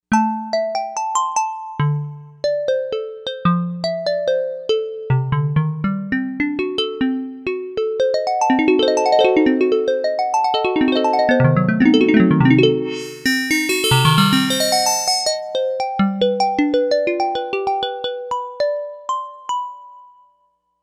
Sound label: Harp of Glass; Novation UltraNova; Factory Patches